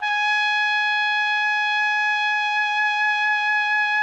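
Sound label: trumpet; Roland - LA Composer vol. 3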